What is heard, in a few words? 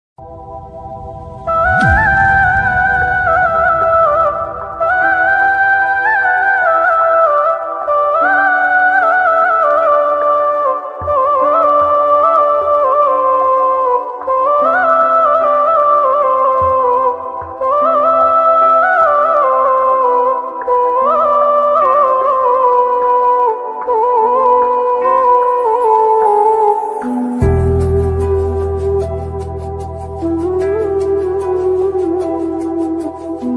sad music